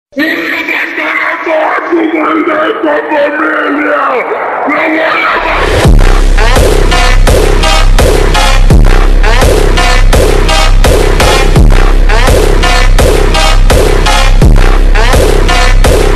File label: Funk Hardstyle Remix slowed reverb